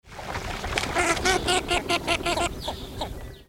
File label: Voice Northern Shoveler